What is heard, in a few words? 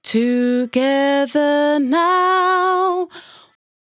100bpm Bm, Velvet Vocal; Zero-G